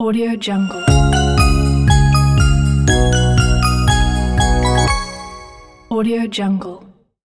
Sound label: christmas logo